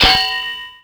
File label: bonk metal outdoor; terrains; Tony Hawk's Project 8; XBOX